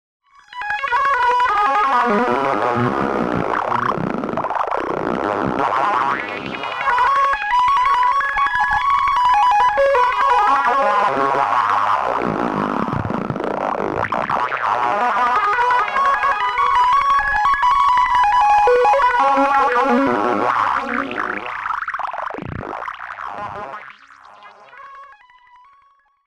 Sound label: highpass same with wiggly r